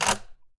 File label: insert, gun